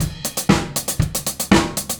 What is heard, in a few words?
Straight Kit, World Pop Beats